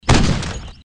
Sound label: One Shot Of Gun Pistol; Dude Theft War; Android